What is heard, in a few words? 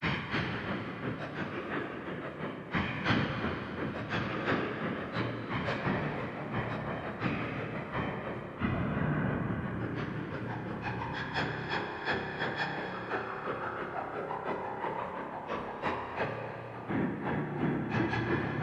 Noise Rag; beat processing